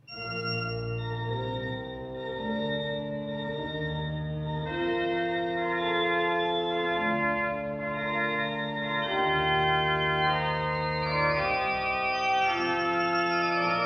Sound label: Two Adagio Movements; Walther